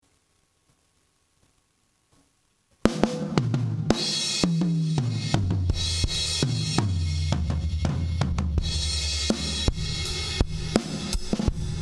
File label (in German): Kompressor Extrem; Logic